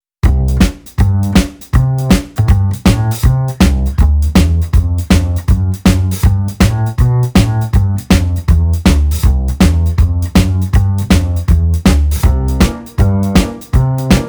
blues

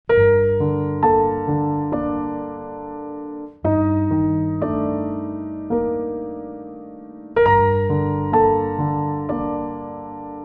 piano, 60 bpm

